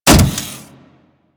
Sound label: Riot Moa Step Default, Footsteps; Warframe